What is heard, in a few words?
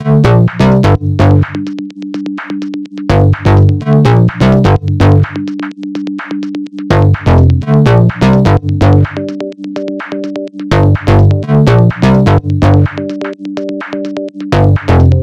songstarter 126 Gm, Pop; Slate; SD PASADENA Pop Sample Pack